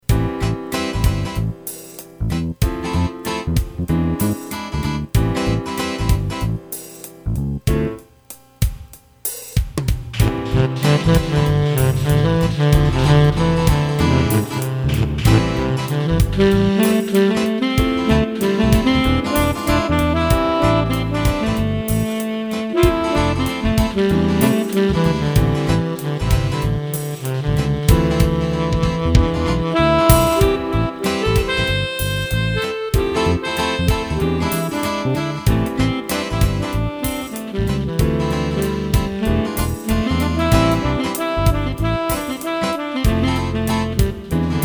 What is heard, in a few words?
Funk Set